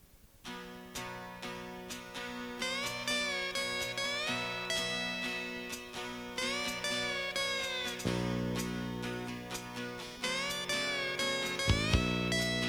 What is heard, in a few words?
instrumental